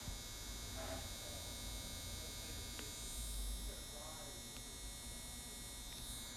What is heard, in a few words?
DLP4500 Hum